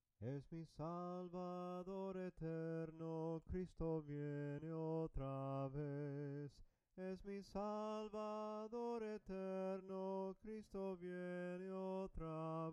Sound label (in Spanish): CRISTO VIENE OTRA VEZ SOPRANO CANTADO; cantos del camino